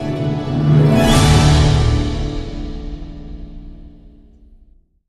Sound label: large Win Loop End; fairyred